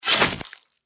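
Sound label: deathclaw claw attack; npc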